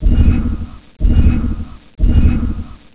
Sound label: signal